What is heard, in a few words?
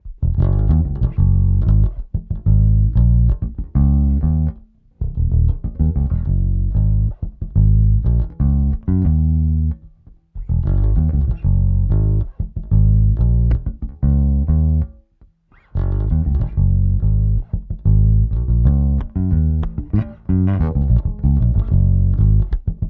Emperor Neck Pickup; fodera